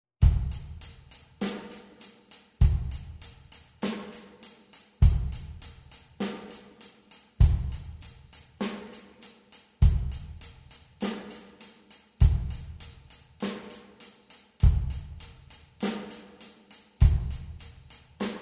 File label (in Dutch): Rock Drums